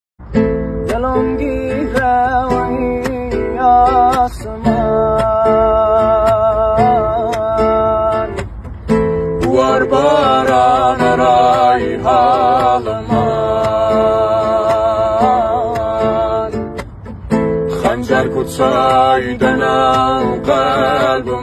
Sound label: Guitar Version